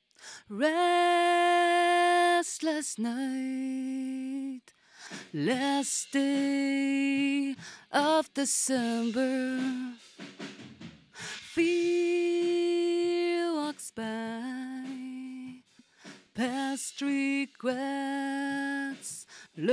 Vocal

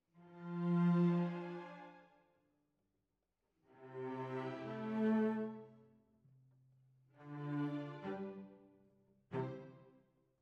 Cellos

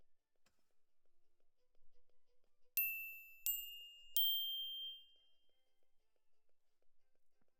Glock Close

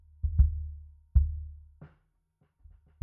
Sub

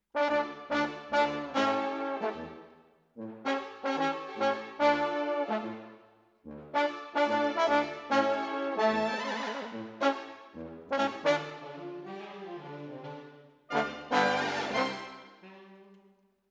Trombones, jazz for piano

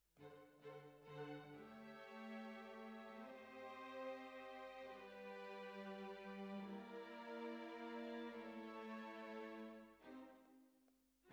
Bassoon, Jazz for piano

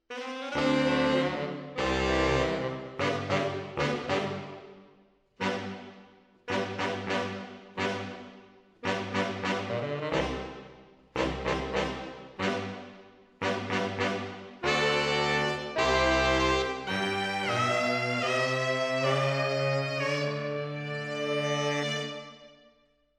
MKH AB, piano

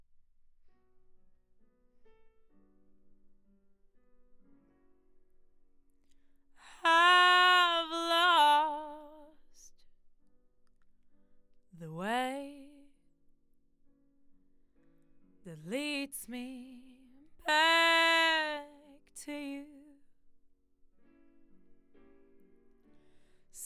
Voc

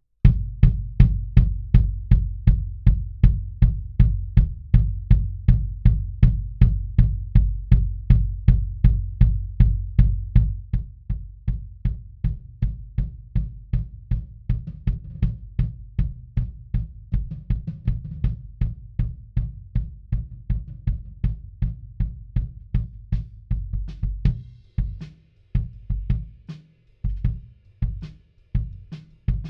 Kick In, 160 BPM

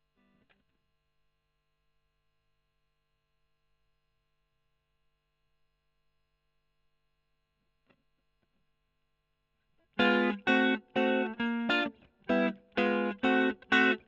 E.GTR Gefell

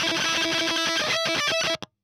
EL Fuzz End

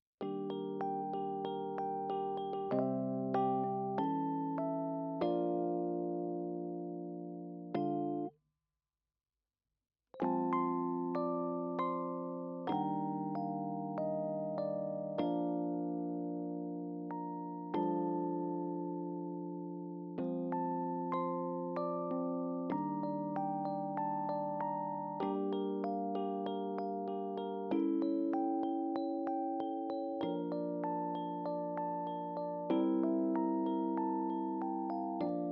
Rhodes

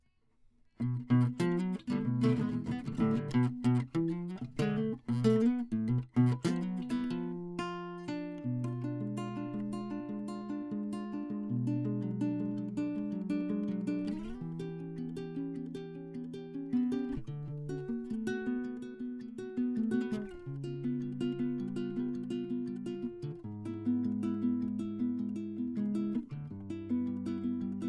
Classic Guitar

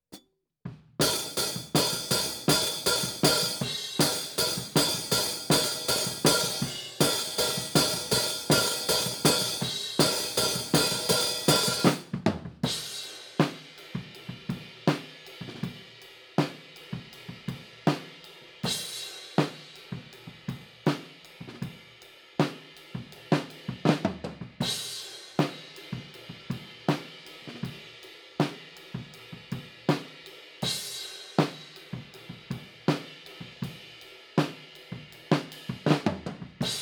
HiHat, 160 BPM

